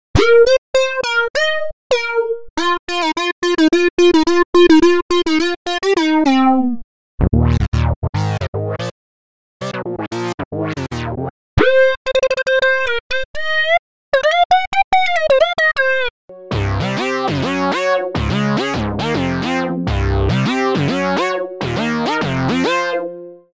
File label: minimoog bank; obx